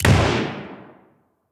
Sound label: Revolver; Weapons